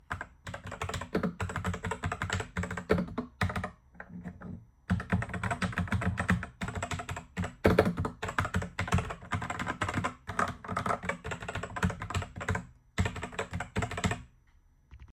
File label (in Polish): redragon stormhunter dzwiek klawiszy